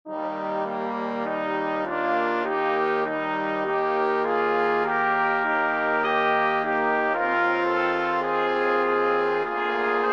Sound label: Brass Quintet; Ave Verum Corpus